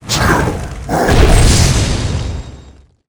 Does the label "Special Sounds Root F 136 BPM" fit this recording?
No